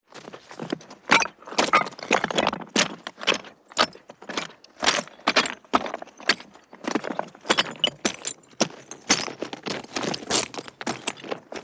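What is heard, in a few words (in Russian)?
идут по камням развалинам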